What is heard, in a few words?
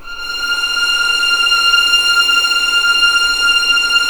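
F6 legato mf violins L; East West; The Ultimate String Collection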